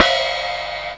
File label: Fairlight IIx CMI Gorgon, Drum Machines; 300 Drum Machines